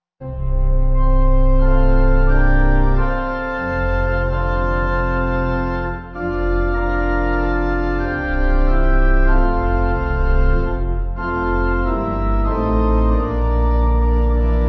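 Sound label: Pipe, hymn backing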